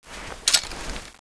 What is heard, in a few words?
fire extinguisher draw